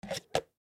Cd Rack Remove Lid; Plastic; Materials; Foley; Blastwave

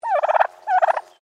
Bird Turkey Domestic Call; Birds (Sound Effects:Animals)

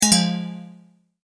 multimedia tone error

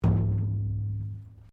METAL THUMP QUIET; Metal (Sound Effects:Materials)

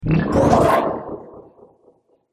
Laser Door Gate, sci-fi laser sound effect; Laser Future - Door Gate

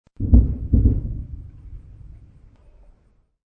Distant Artillery Double; Distant artillery blasts.; Cannons (Sound Effects:War)

